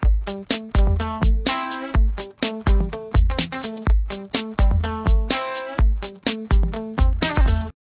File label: ringer; Ringtones